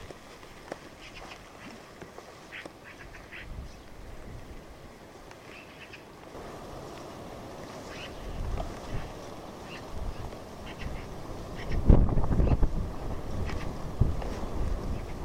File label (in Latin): Pica pica; SETE